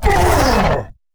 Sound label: hurt, creatures; combat; ryuchi; CosmicRage